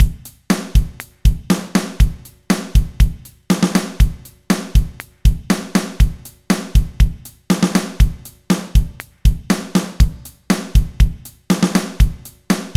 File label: Classic Rock FULL; common beats